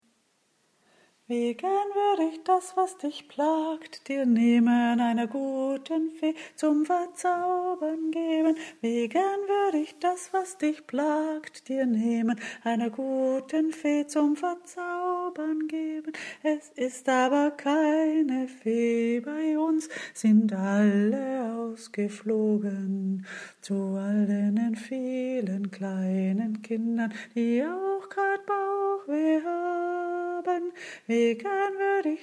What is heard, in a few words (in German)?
kinderschmerzenliedchen hochdeutsch